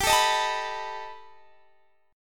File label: B Augmented 7th G, chord